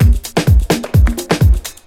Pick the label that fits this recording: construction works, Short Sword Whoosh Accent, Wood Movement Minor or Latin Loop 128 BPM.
Latin Loop 128 BPM